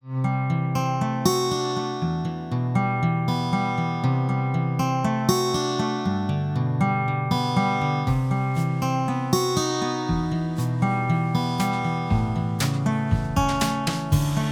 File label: Smart Guitar; Name That Tune